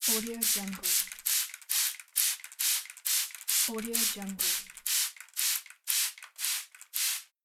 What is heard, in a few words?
spray water bottle